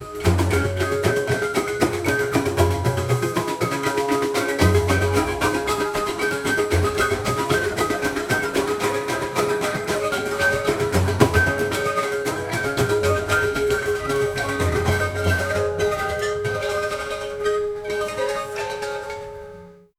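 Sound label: joyful instrumental, devotional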